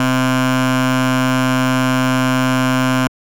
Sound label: bass C4; CCTV